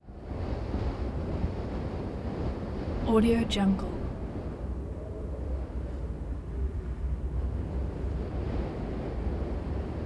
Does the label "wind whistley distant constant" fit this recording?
Yes